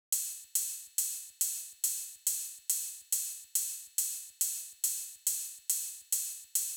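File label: drum Loop 140BPM overhead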